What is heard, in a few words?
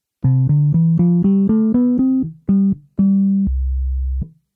Weltmeister Basset